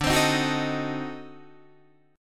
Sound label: D 7th Flat 9th D down Guitar Open D